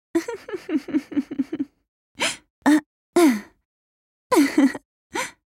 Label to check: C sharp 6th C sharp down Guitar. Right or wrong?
Wrong